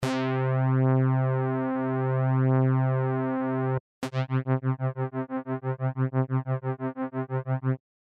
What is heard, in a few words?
Tremolo